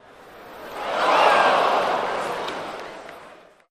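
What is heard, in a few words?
Crowd Cheer Ooohh Outdoor, baseball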